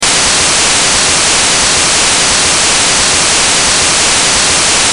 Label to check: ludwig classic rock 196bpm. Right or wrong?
Wrong